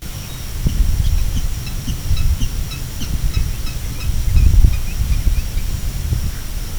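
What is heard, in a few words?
sqwauk, Purple Gallinule; National Marsh Bird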